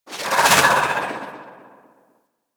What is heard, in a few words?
Spear Projectile, Weapons; Warframe; Tenno; Priest